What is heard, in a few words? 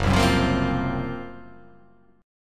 F sharp Major 7th Flat 5th